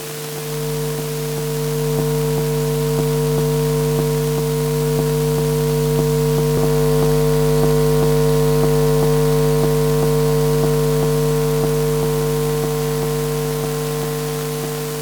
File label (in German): solarpark, elektrosound